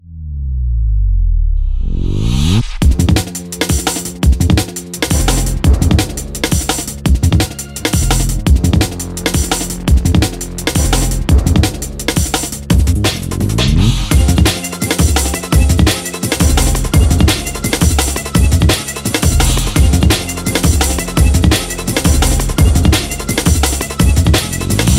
Beat; NBA Live 06; PlayStation 2

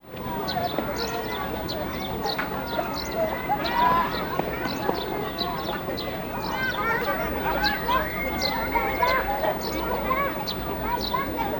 Ambient BGM

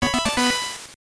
Jingle, GBC

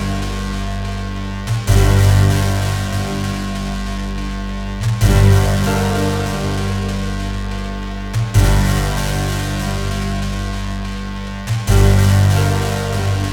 boss loop 144bpm

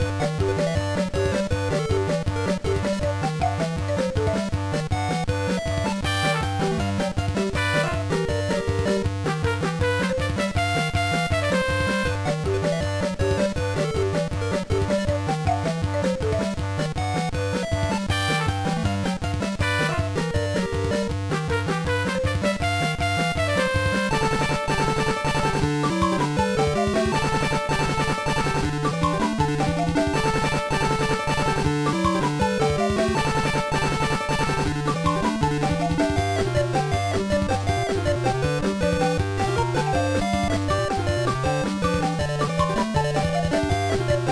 BGM; Koro Koro Puzzle Happy Panechu; GBA

